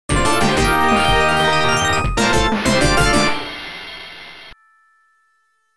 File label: game over